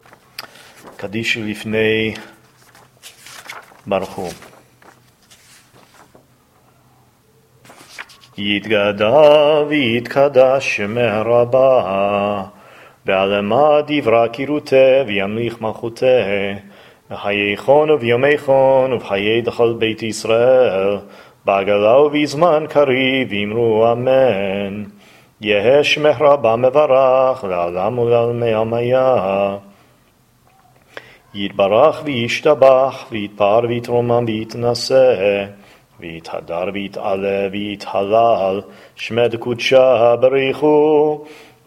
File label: Kaddish and Barchu